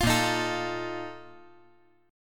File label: Bb Major 7th Suspended 4th, chord; strummed; JGuitar